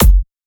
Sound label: kick, drum hits; Anthemic